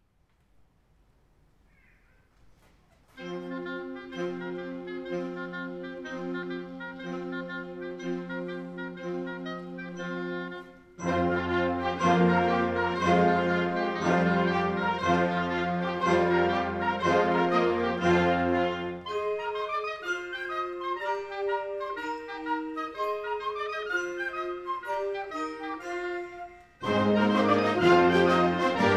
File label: Irish Trilogy III. Jig